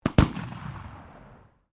RIFLES BLACK POWDER REPEATERS FIRING, Black Powder firearms at a shooting range; Guns